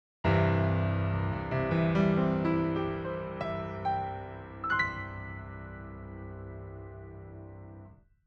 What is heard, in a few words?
basic dry piano; uvi